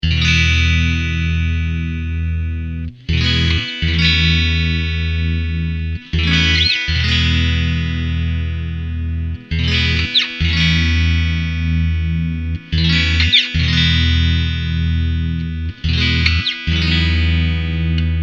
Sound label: pig iron clean bridge; axetec